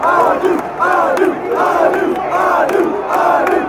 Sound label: USA chant, fan chants